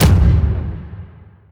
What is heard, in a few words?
Double Coilgun